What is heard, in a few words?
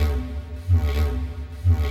ethno, 125